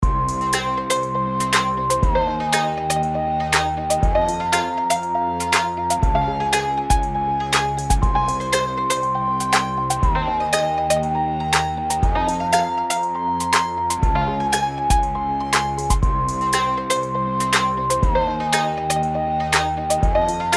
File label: loop progression light drums; songsnacks